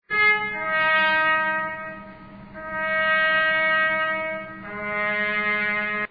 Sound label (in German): zapfenstreich